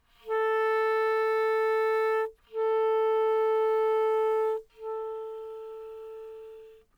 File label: Bb reference